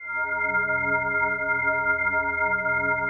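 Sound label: PAD, atmospheric pads; Best Service; XX Large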